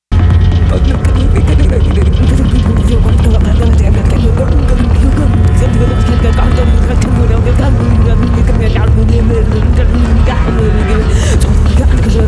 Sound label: Techno Mix